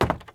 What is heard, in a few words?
wood step; Golden Crayon Sounds